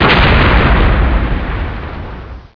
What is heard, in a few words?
Explode; OUTLAWS